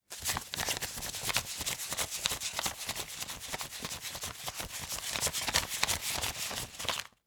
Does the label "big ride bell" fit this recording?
No